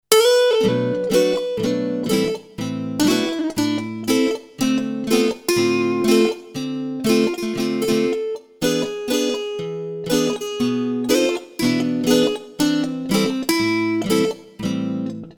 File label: six strings; synth roland jd800; card demo